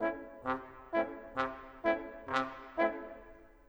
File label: Horn Trombone, Orchestral; Sonic Foundry (Sony Creative Software); Orchestral VOL-2